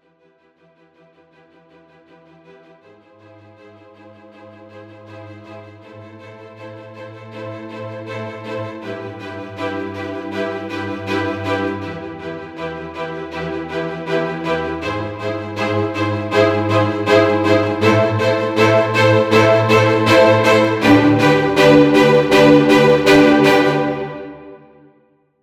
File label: String Ensemble